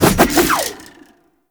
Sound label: Staff Spin Swing; Warframe; Duviri; Dax; Weapons